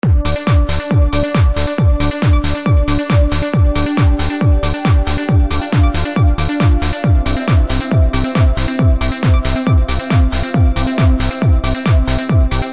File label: trance